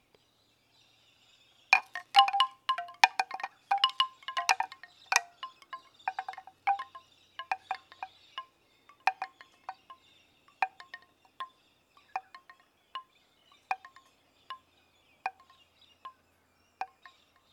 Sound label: Bamboo Windchimes